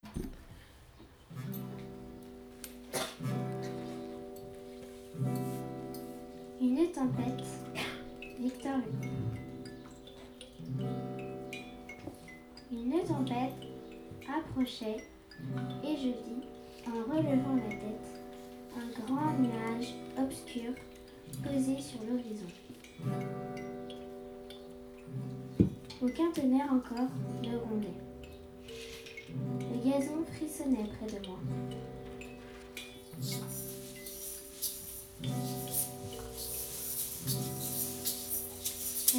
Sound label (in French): Bruitage Tempête